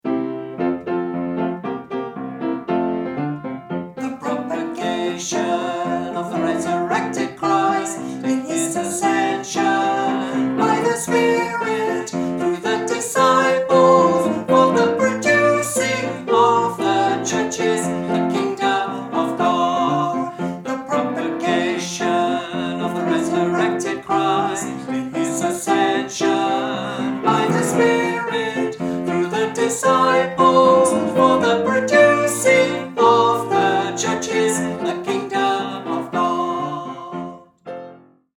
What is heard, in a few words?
sing, hymn; New Songs